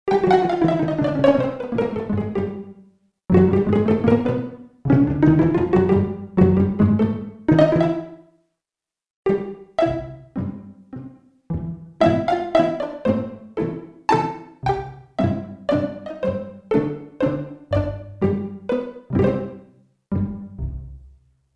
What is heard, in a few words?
Real Pizz, Roland JV-880; Factory Patches